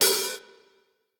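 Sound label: defeat hi-hat; smite; raijin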